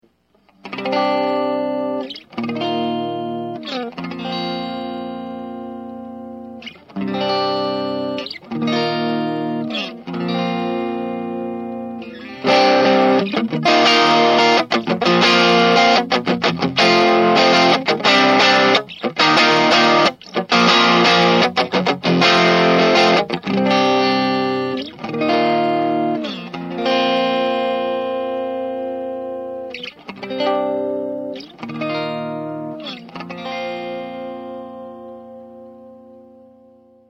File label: 18 watter; neck